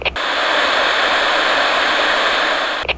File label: Radio Static